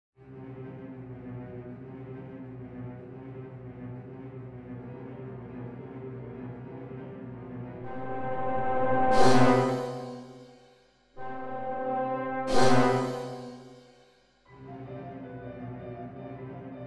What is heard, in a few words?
Suspense